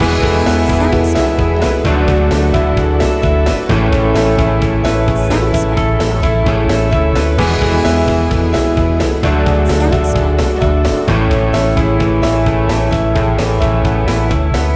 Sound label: Good Vibe Pop Loop